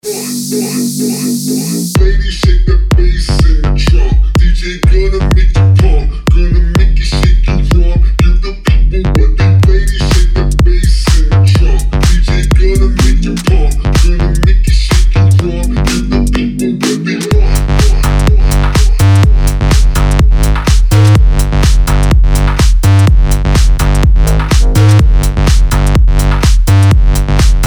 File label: loud control dirty bass